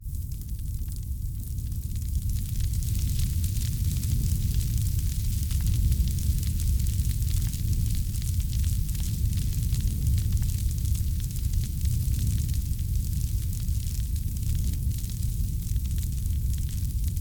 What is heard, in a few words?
Fire burn Burning Campfire Flashing Decay And Sustain